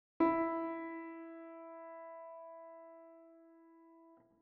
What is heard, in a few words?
e mf Pianoteq